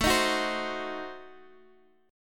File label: A Diminished 7th A down Guitar Half Step Down